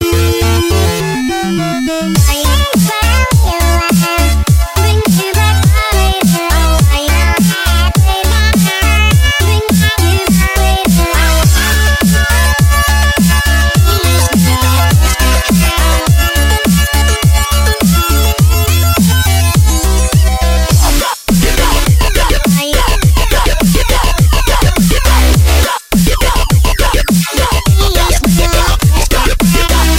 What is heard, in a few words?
Arcade